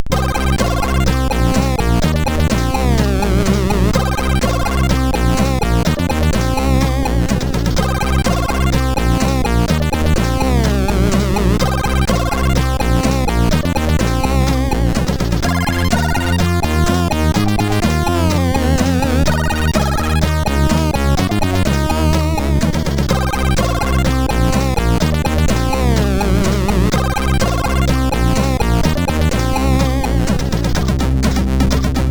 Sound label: MOS6581R4